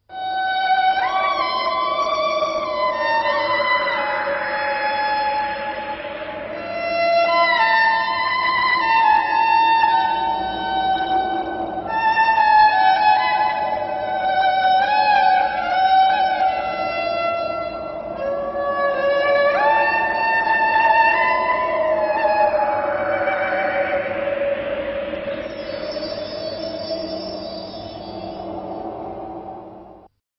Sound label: violin ringtone; mohabbatein